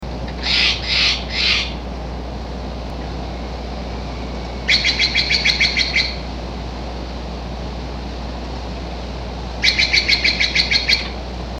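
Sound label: Steller's Jay mixed kwesh & gun calls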